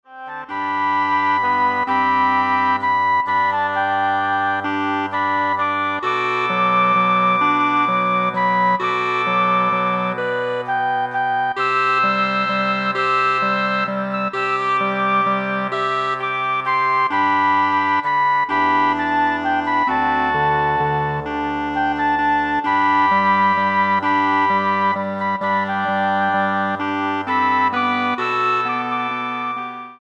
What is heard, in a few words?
Woodwind Ensemble